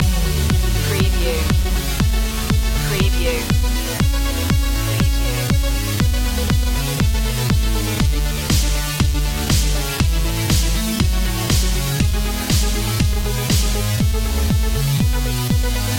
electro bass dance loop

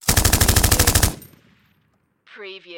Machine Gun PKM

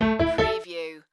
Casino Slot Machine Honkypiano

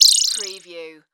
Multimedia Beep

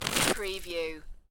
Snow and Ice, Ice scrape with ice fragments

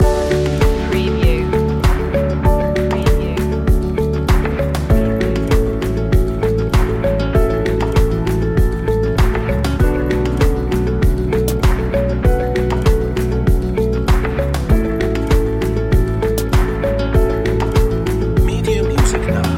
natural tendencies loop

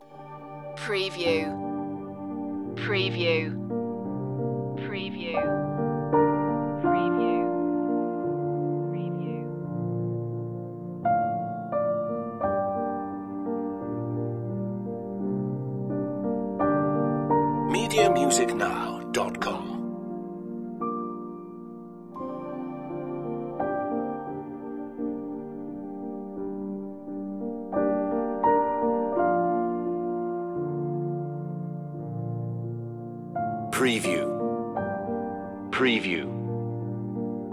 ambient singularity

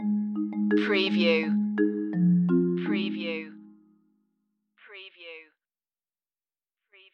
spring to life stinger

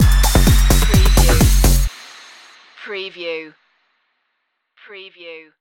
dancin stinger

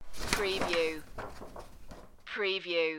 Paper Rustle

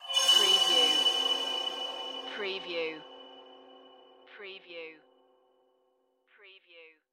Metallic

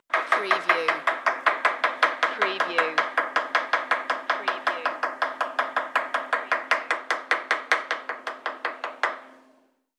Chef Cutting

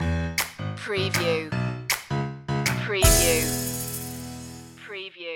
toddling toys stinger

